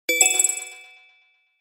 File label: Standard Message